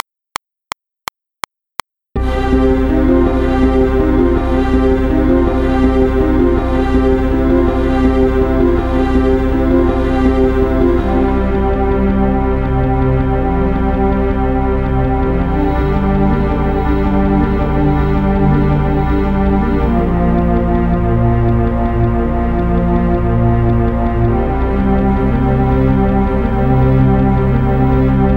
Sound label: Backingtrack BPM 167